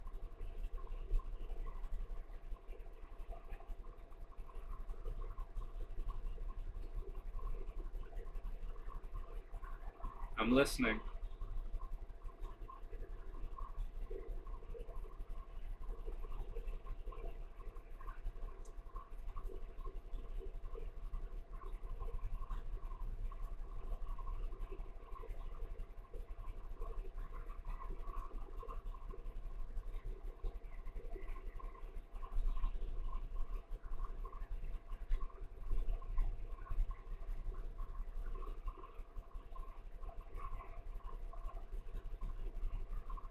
Dry Storage EVP

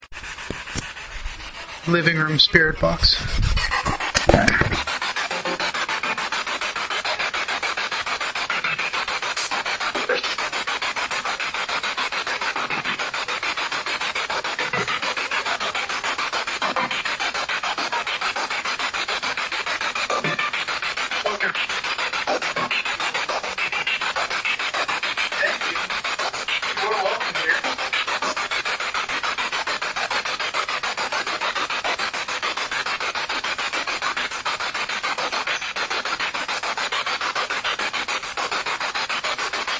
Living Room Spirit Box; private residence freedom road apartment investigation

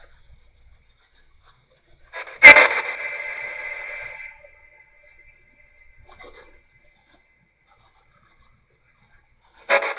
Living Room Portal; historic rainworth lodge investigation

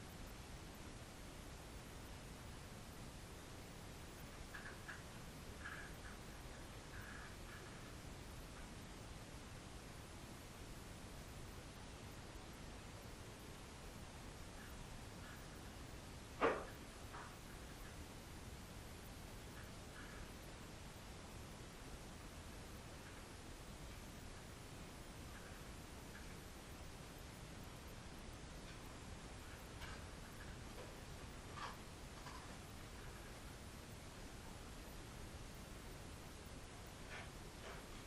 Grey Indian Head Room Static; wexford general store antiques investigation

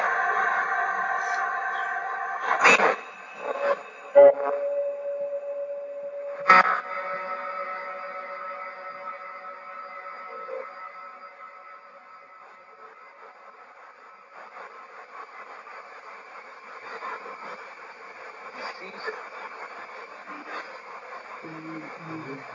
Library Spirit Box

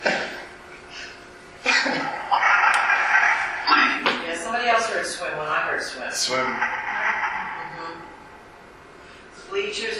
Stairwell by Pool Phasma Box; beaver area high school investigation

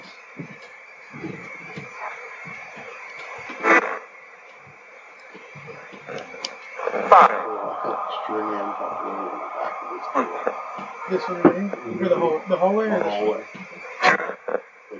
Walking Spirit Box; wexford general store antique shop return investigation

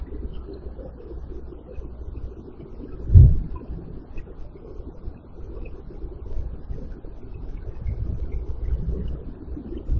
Static

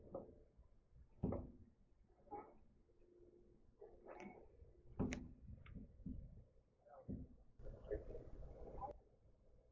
Up Couples Room Spirit Box; wexford general store antique shop return investigation

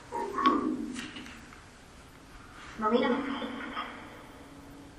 Dungeon Orphanage Phasma; fairfield county infirmary investigation; 4th Fl

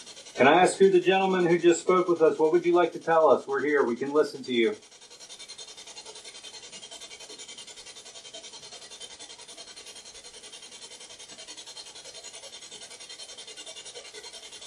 Room 428 Spirit Box

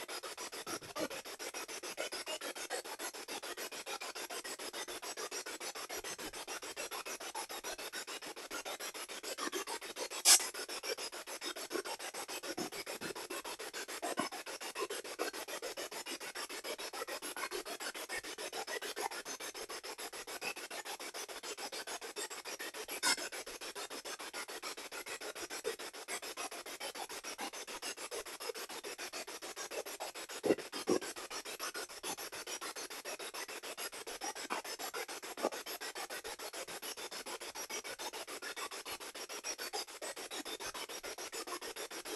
Spirit Box; Demon Room

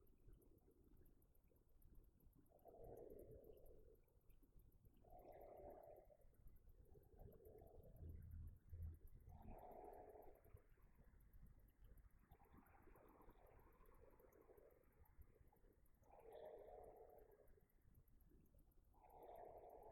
Stairwell Static; poasttown elementary school investigation

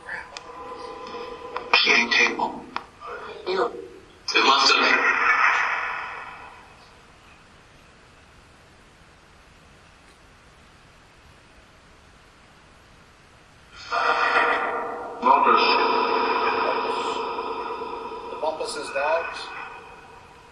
Phasma Box; beaver area high school investigation

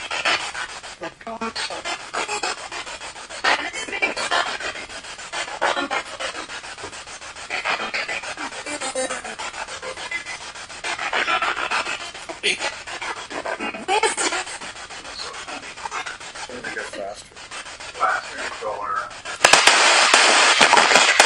Spirit Box; Attic Small Room

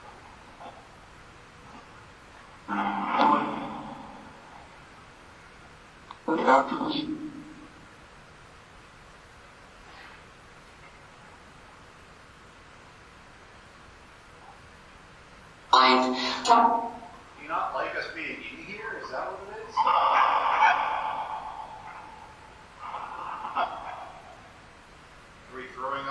Auditorium Phasma Box

